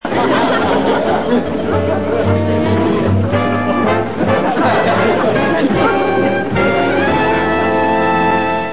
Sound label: music